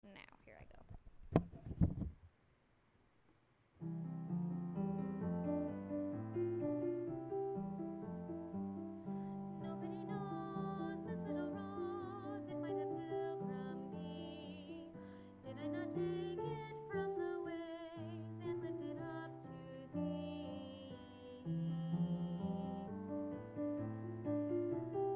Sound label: low vocal